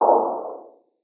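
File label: player gun distant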